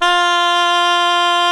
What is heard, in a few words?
gralla F4; SoundBytes - Early Patches